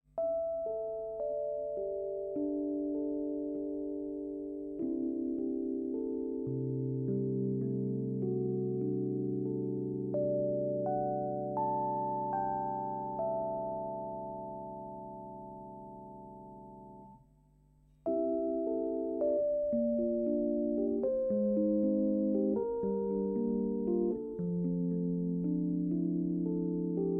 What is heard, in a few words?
meditation